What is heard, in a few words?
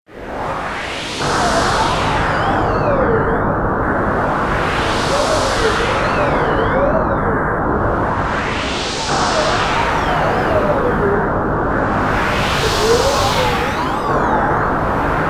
Arctic Winds, Roland JV-880; Factory Patches